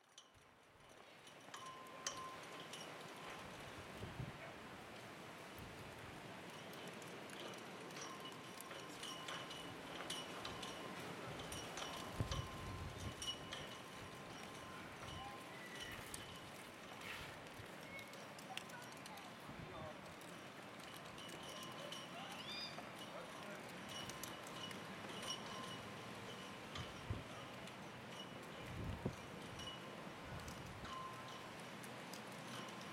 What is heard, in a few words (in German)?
warnemunde einkaufspassage mit mast